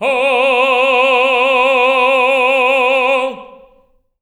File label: TENOR C4, Voices; Spectrasonics; Symphony of Voices